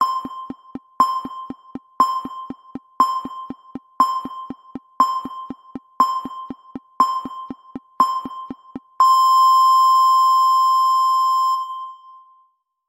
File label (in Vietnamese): tiếng chuông hết giờ hết thời gian 10s